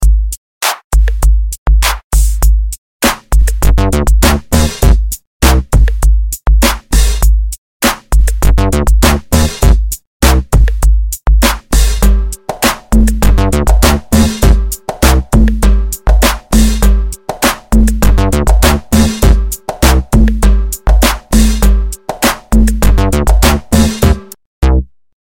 Funky Lead

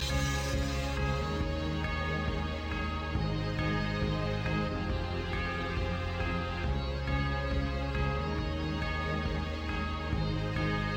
Orchesta

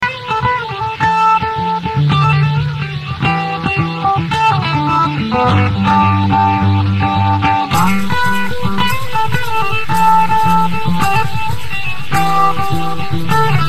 Nice Guitar

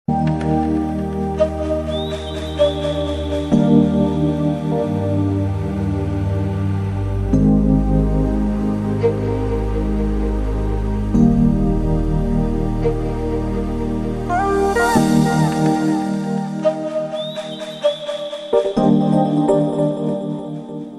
Piano Birds

